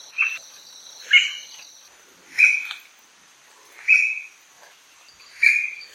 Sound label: Greater Yellownape calls, Kewzing Ravangla South Sikkim India